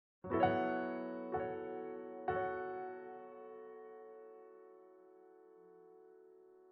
Factory Boss Piano; Factory Boss Piano10

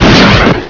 Launcher Shoot; Amiga; damage sadistic butchering of humanity

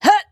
Flyer Attack; Col Flyer Attack

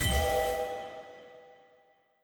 Skill Templar Spell

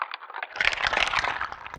Spider Zombie Shake

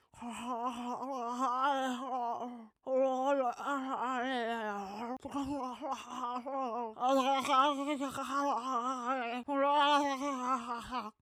Junk Fluke Long Loop Nervous

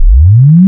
Rewind Jump; Haptic

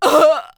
Enemy Generic Human Death Crushed

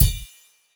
Parry

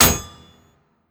Debris Death Hit

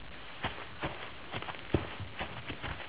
Animatronic Walk; Five Nights at Freddy's; FNAF 2

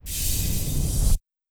Collect

